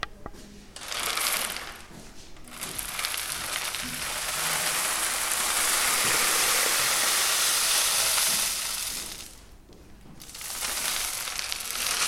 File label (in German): Regenmacher